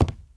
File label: wood walk; blocks; step; Minecraft; Remastered